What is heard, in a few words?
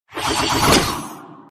skill bullet; silverfox